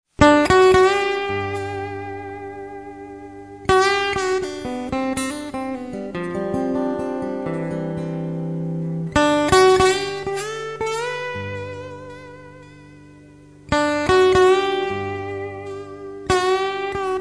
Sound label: walking blues